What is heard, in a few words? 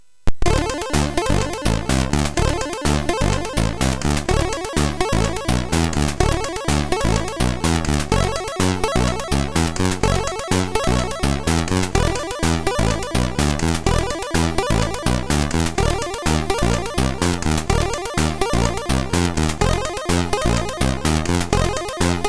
Jazzy Tune, MOS6581R3